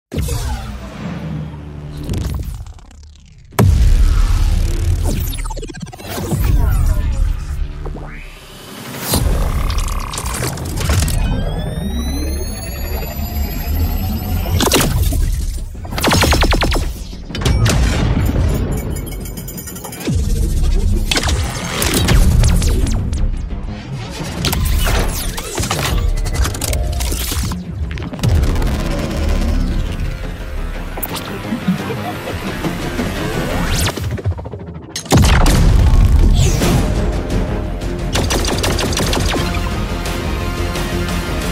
Future weapons

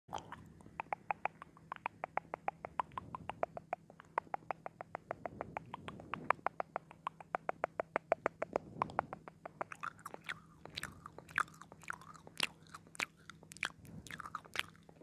ASMR Mouth

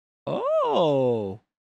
Ooo

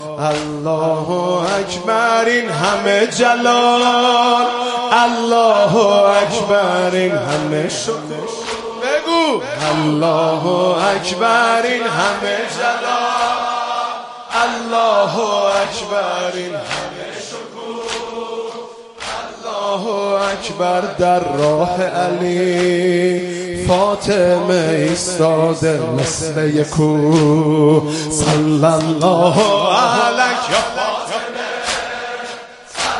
madahi ringtone

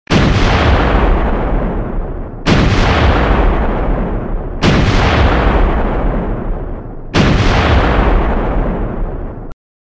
artillery

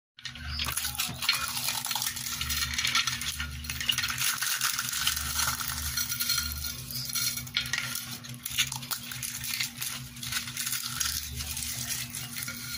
egg shell

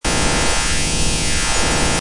Zing

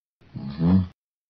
Ammm

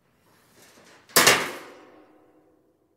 Metal shelf

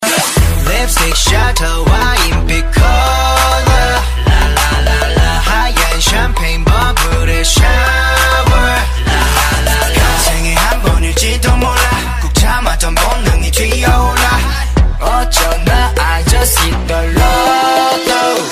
Korean ringtone

